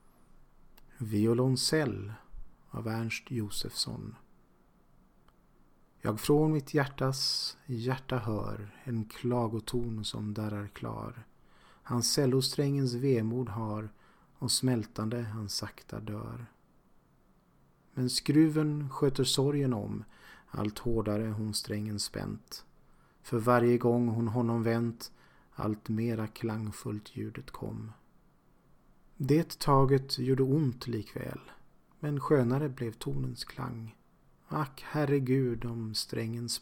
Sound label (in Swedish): violoncell